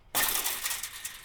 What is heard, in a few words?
fence rattle